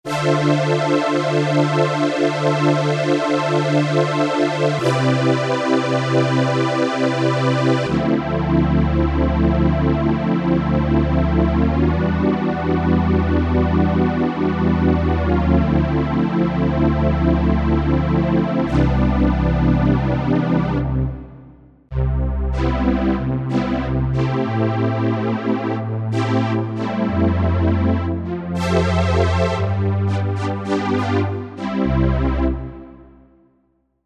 Pad, Korg MSC-12S Synth; Synth 3; Famous Pad 2

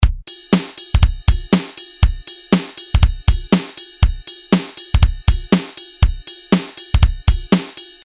FUNKY2, Roland R-8

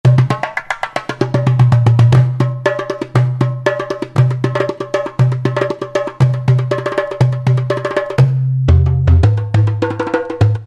Egypt Tablah; World; Roland SR-JV80-05

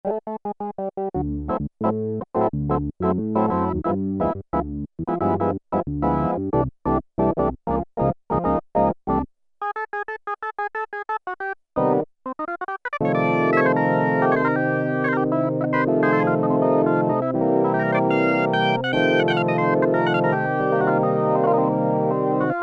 ROTARY ORGAN, Oberheim OB-8; Factory Patches

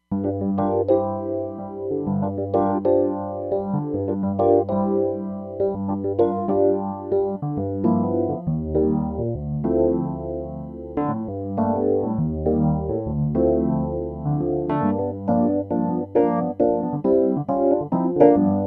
Stage Phazer, Roland Fantom XR

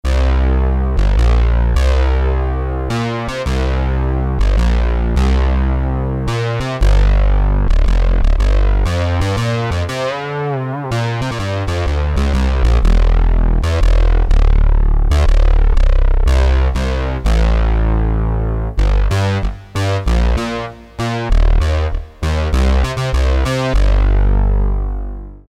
Syn BASS, Korg DSS-1

